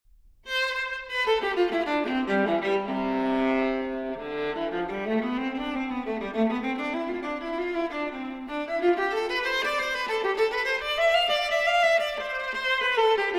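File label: Cello Suite No. 3 in C Major Transcription For Viola Prélude; J.S. Bach; BWV 1009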